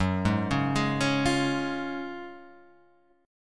F sharp Major 7th Suspended 2nd, F sharp, down, Guitar, Half Step Down